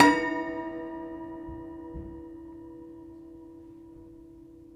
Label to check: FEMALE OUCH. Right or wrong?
Wrong